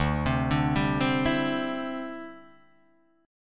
D 9th Suspended 4th D down Guitar Open D

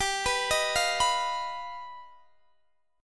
G Augmented 7th, Guitar DADGAD

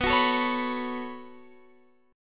B 7th Suspended 2nd, Guitar, Open F; B7sus2; strummed

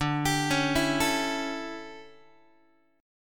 D Major 7th Suspended 2nd Suspended 4th D, Guitar; DM7sus2sus4; arpeggiated; JGuitar

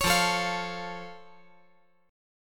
F Augmented F

